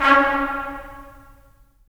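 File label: brass trumpets staccato, right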